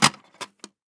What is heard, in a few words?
box insert, m249; weapons; Urban-Coalition; Anarchy; arccw ue